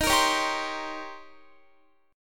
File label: Eb 13th, Guitar; Eb13 strummed; x,18,20,18,19,19; JGuitar